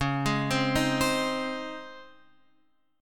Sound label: C sharp Major 7th Suspended 2nd, C sharp; C#M7sus2 Chord {9 11 10 8 X 8} arpeggiated